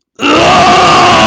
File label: raaarghhh